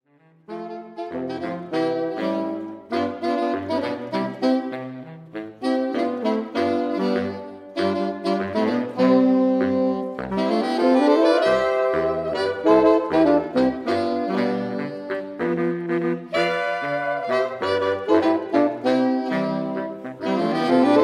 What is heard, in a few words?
lemon merengue, sax quartet; Light; Jazzy